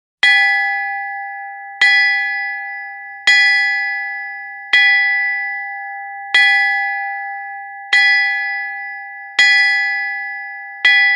Church Bell Small G4, bell towers

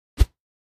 Racquetball Swing

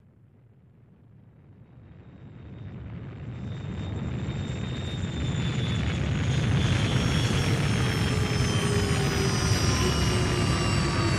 Flyer Jet Rocket Land, spaceship

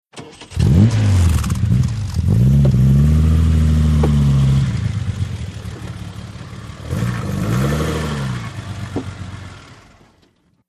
Aston Martin Parking; ext.

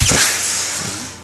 Future Gun Shot Sing, futuristic

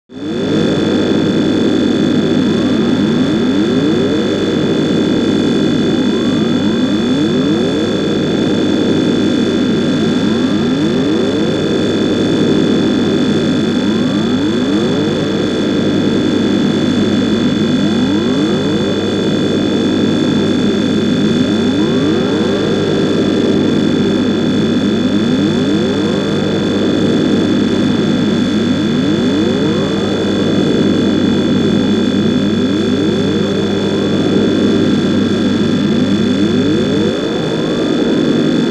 Buzz Thin Electronic

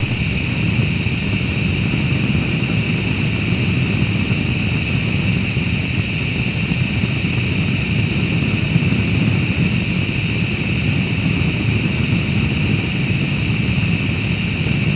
Bunsen Burner, Gas Burning, Loop

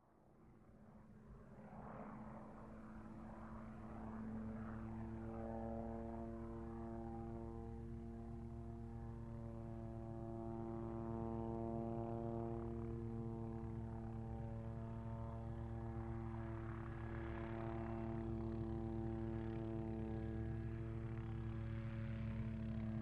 OH6 Loach Slow By Away, little bird

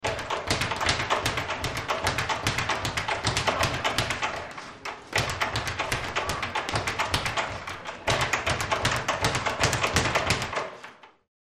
Boxing Speedbag Punc, boxing

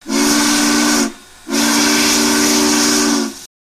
Train Whistle Blows, steam train

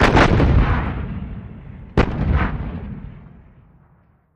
105mm Howitzer: Fires, Close-up. Dual

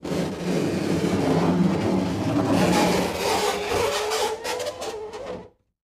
Fingernails Scrape, chalkboard

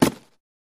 Cardboard Puncture; paper mvmt

